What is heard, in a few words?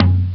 low bongo; ST-XX Amiga Soundtracker Sample Packs